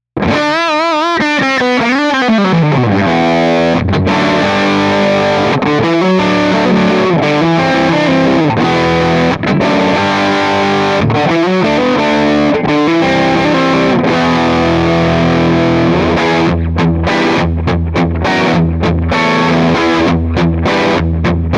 Channel 2 mehr Gain Tone Drive, Les Paul

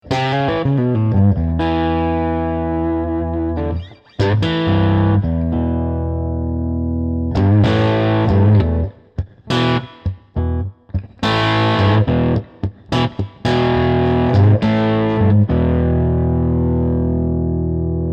Blue Note Röhren Amp OD Blues Comp; König

